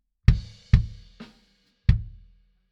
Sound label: BD In